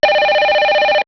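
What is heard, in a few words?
phone ring; Microsoft Graphics Studio Greetings; Microsoft 1998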